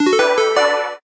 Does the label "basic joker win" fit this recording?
Yes